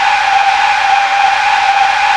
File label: skid